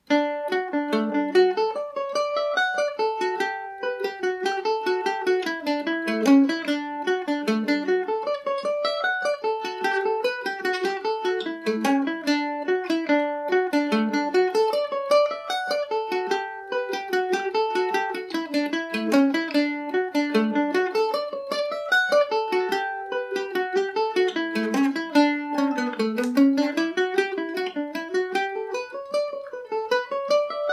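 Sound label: D major, irish mandolin; silver spire revisited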